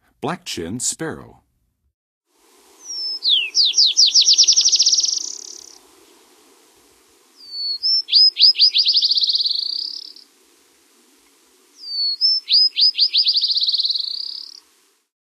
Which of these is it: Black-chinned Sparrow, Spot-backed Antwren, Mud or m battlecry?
Black-chinned Sparrow